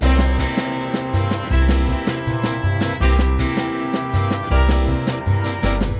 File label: Pie Shoot (Hurry!); Toy Story Mania